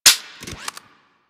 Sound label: Fire Mech, Rifles; Snipetron